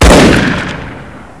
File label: tank mg